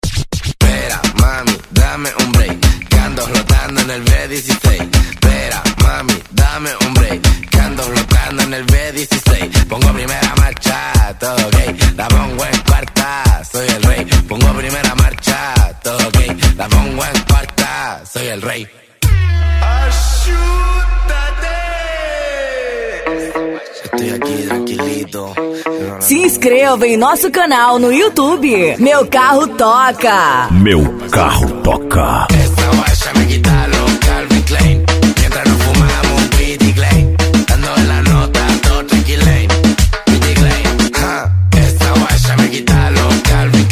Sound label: Reggaeton